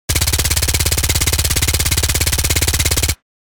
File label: MAC-10 submachine gun suppressed burst; war